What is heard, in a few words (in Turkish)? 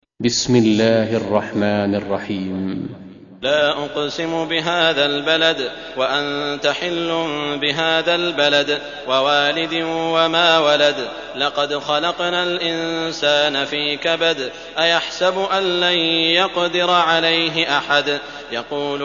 Beled, kuran; sudeysi sureler